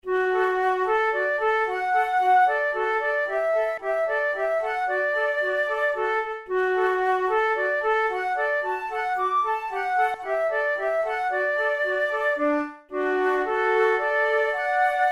duett, flute

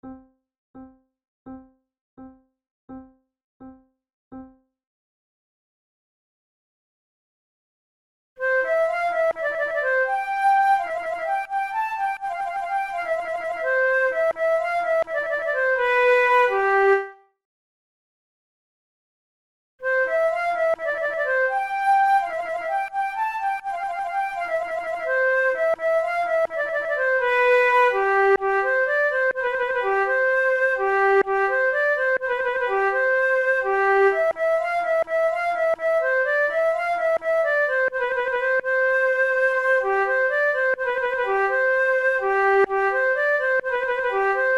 fanfare, flute; naudot

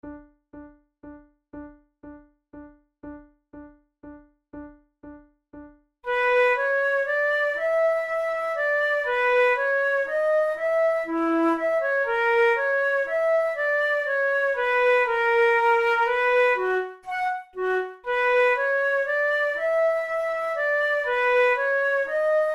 duos grazioso, flute

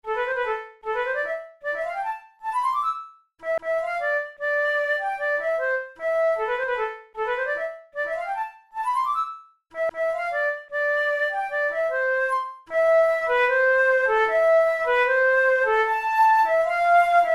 arabesque, flute; burgmuller

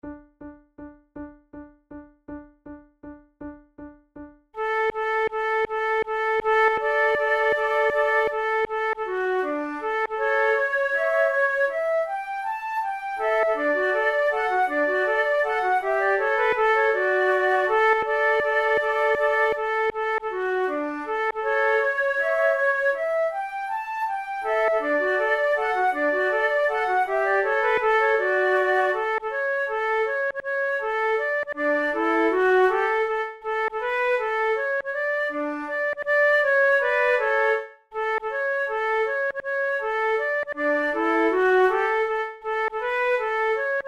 waltz trio, flute

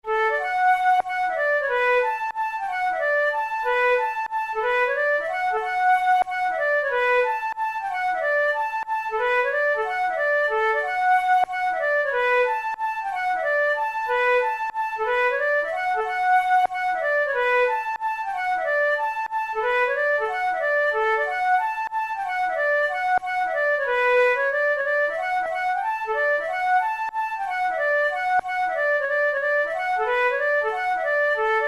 barndance, flute tunes

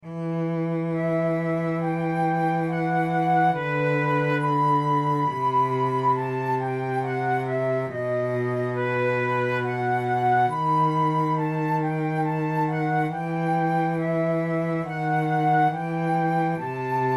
recorder sonata in g major adagio; marcello op2 no5 iii

